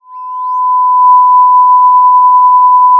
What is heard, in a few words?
Sound of a police car siren